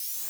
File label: Menu Zoom; Menu FX